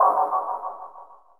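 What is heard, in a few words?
WATER DROP; AKAI Anime Sound FX; NIGHTMARE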